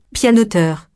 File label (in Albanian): pianoter